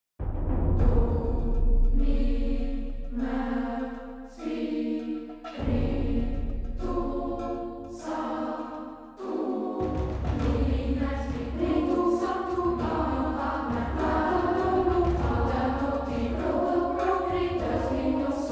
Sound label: Staccato Build